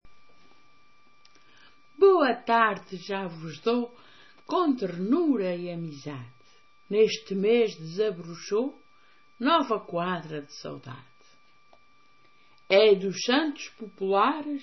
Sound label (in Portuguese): santos populares; Gravações da Azoriana